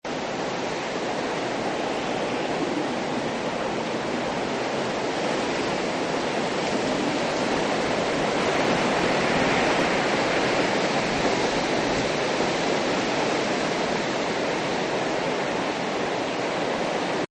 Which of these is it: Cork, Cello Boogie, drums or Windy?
Windy